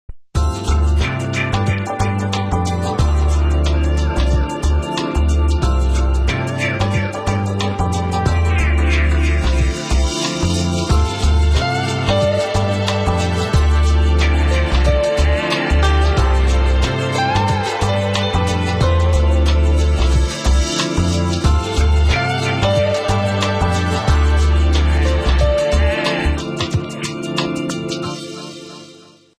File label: Chilled Out, music